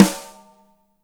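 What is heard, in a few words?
DRY snare, NATURAL KIT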